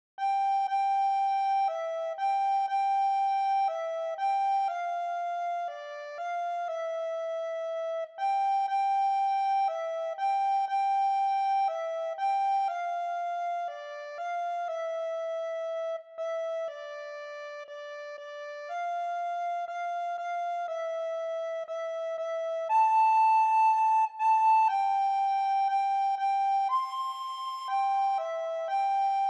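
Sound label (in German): solo Ihr Kinderlein kommet, Sopranblockflöte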